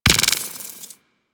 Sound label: Mortar Mine Drop; Warframe; Venus; Venus Terra Mortar Moa; Abilities